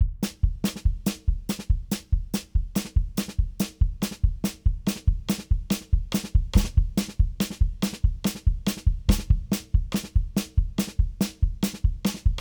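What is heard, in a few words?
Battle Layer Percussion; Plants vs. Zombies Battle for Neighborville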